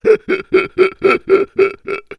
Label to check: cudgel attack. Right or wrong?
Wrong